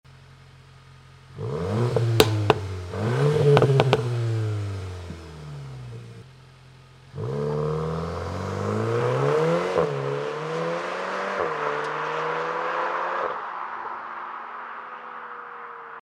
golf 8 r variant offen fahrt sound; Catback-System; Abgasanlage; Sport Modus; apr

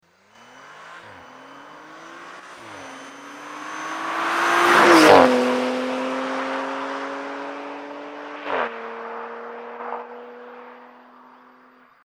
RS4 Vorbeifahrt, Abgasanlage Audi RS4 B9; Vor Opf

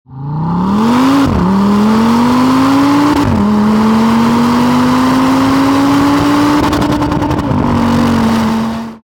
AGA Fahrt Begrenzer, Abgasanlage Audi RS 3 Sportback 8V; Fahrt 01 mit Begrenzer; stoll sport

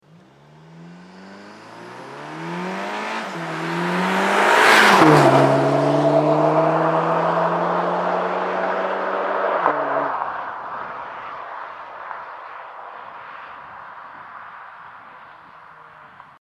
Vorbeifahrt, Catback-System Golf VII GTI; OPF; vor facelift; Abgasanlage

